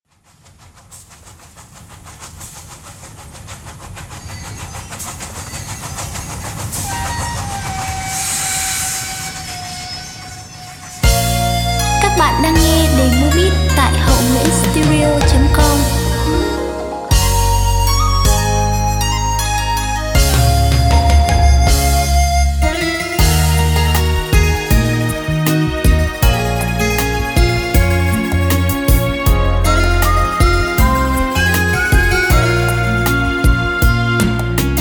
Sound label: beat, playback